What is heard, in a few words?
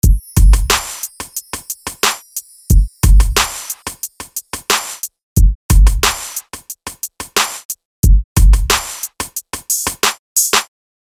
drum rnb kit